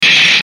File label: Static Soft Burst, Static Noise Fast Burst; Production Element Imaging Element Accent Transition; Blastwave; Static Sound Effects